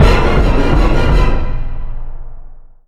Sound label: jumpscare stinger